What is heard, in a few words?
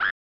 Short Scream; Time Trax; snes